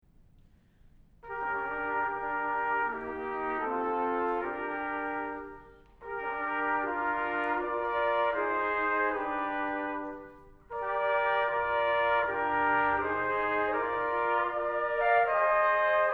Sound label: Fanfarria, Andante religioso